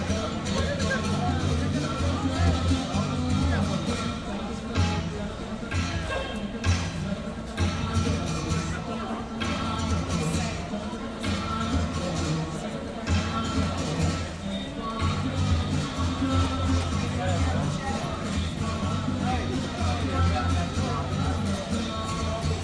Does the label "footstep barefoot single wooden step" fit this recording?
No